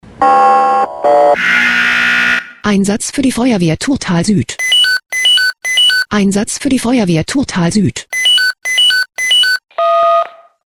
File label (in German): SMS Alarmierungstone; FW Thurtal